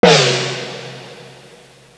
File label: GONG